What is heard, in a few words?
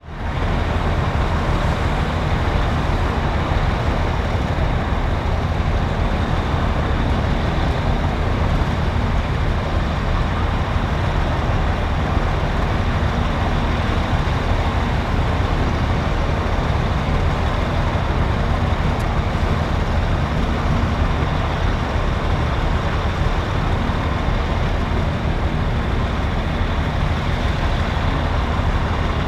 transport hovercraft commercial idling